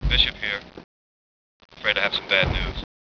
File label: program error; alien